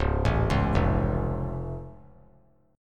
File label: C sharp Augmented down, chord; arpeggiated; JGuitar